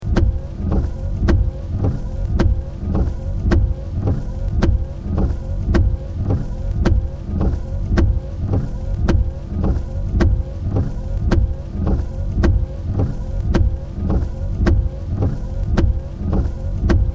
Windscreen Wiper, looping car windscreen wiper on fast setting.; Cars; Vehicles